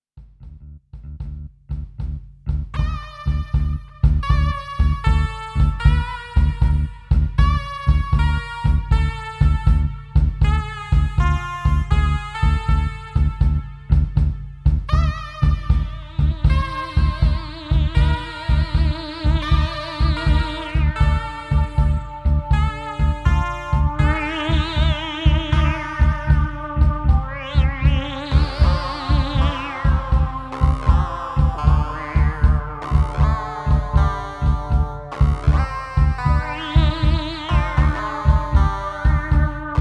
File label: ARP Katzenjammer